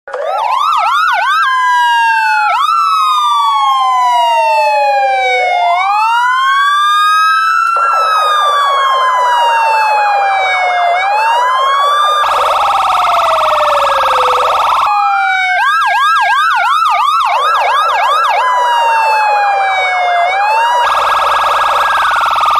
police siren sound effect

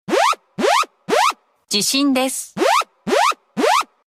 japan EAS alarm phone alarm

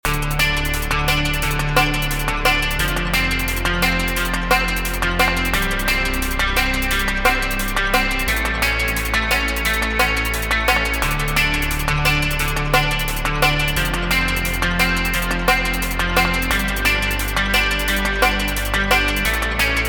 fast rocky loop

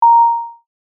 short punchy sine wave ding, A

